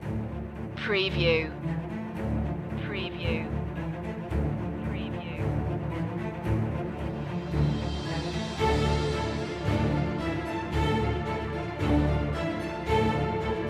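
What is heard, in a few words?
no percussion intro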